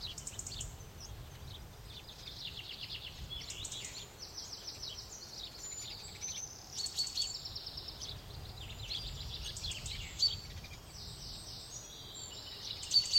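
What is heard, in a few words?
Scottish inn exterior morning; the 39 steps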